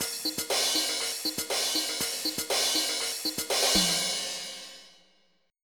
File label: Funk